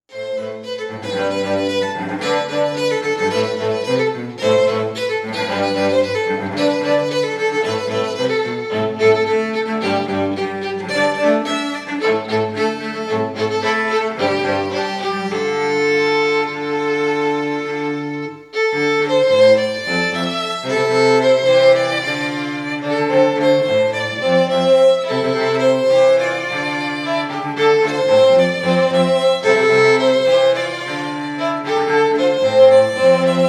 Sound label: trio